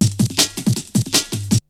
BREAKBEATS, TECHNO

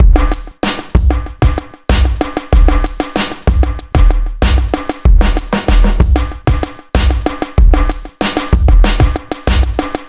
Beat, Drums & Percussion; Electrocution; Beats

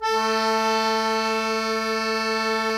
KEY, Cajun Acord; Club-50 - Foundations Roland; Accordians

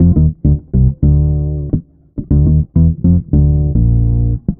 E-Bass + Analog Synth; Sonic Foundry (Sony Creative Software); Leo Cavallo Bass Taster

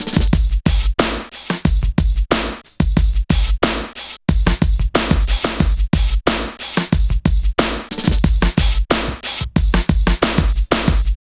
LOOP; East West - Phat & Phunky

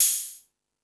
Hip Hop, Drum Machines

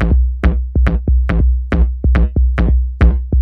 Beats, Industrial

